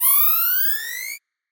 scifi weapon power up high

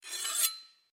Blade Dragged

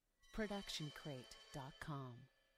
crash cymbal 16 inch scrape; soundscrate

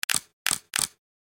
camera clicks

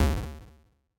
8 bit failed hit

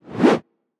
woosh

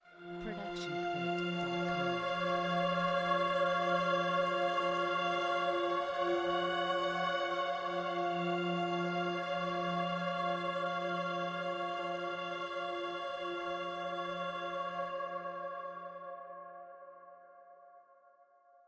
Mystery Piano; soundscrate

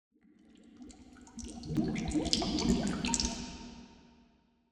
water swell; soundscrate

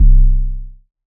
electronic kick tone; soundscrate

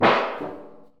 metal trashcan lid pop; soundscrate

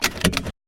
seatbelt unbuckle

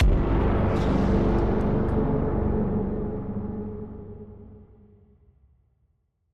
Cinematic Braam

